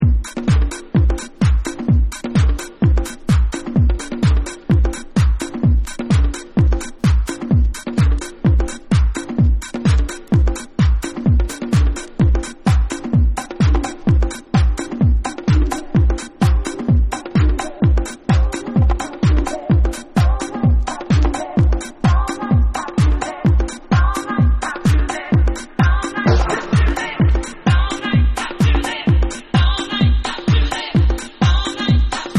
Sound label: HOUSE MUSIC Dub